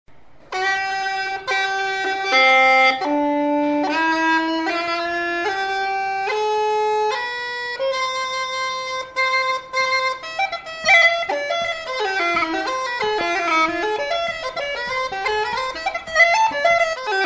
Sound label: chanter; tommy martin